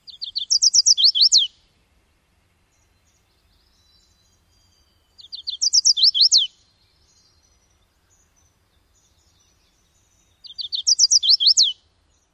songs; NY

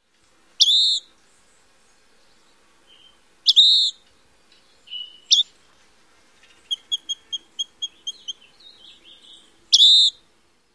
calls

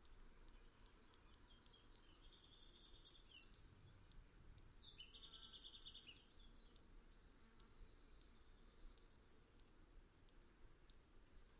tip calls, YEEYJU

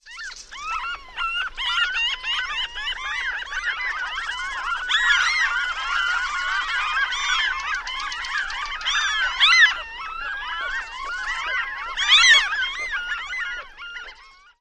calls of flock

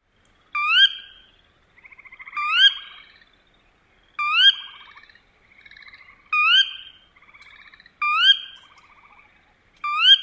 ker wee

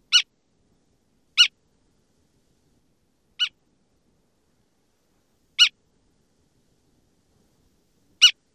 calls

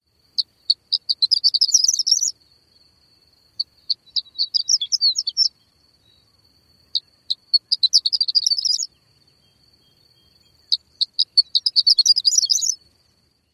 short song; NY

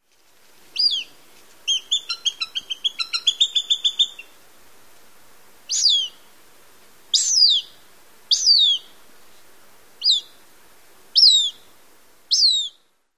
various calls of male

